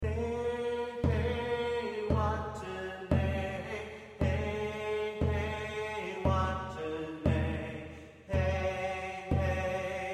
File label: hey hey watenay vocal; hey hey watenay song